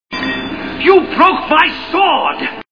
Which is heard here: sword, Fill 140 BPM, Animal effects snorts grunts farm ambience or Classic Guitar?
sword